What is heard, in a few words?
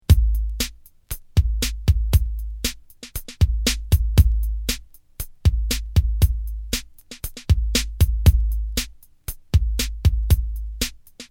beat